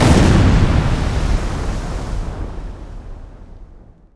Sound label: small water explosion; WAR